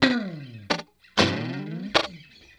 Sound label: Electric Guitar